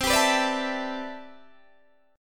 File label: C Major 13th C, Guitar DADGAD